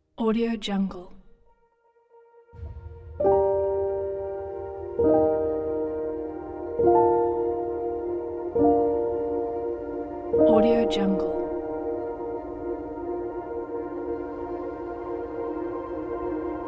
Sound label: waking up